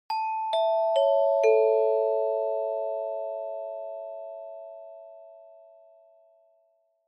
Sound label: Chime Announce (4 Tone Fast Down)